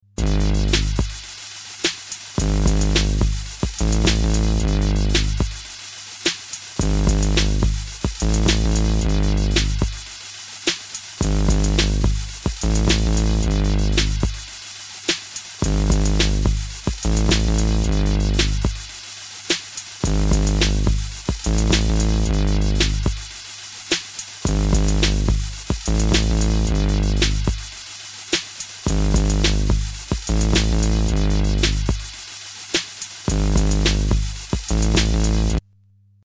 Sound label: beat sketch